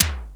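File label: electronic drums; Crypton - DrumBase5000; ELEC.DRUMS#3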